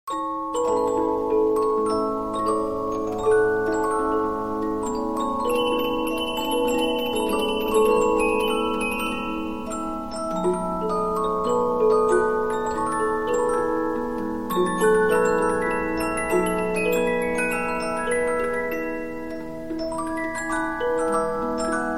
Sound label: Polyphon, 15inch disc musicbox